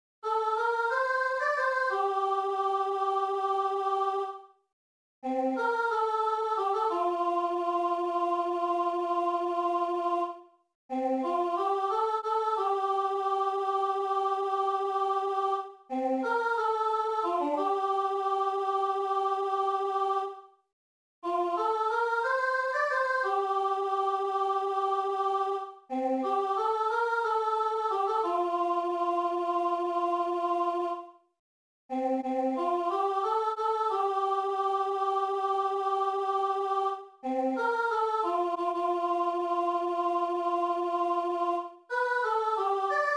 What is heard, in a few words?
music alto; Si ca vous chante; mes 124 a la fin